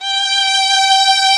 Tron Strings G4; Proteus 2000; Digital Sound Factory Proteus 2000

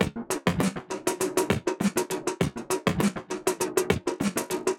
Ghost Loop 100BPM, drum loop; Ghost Layers; layering

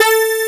ATTACK

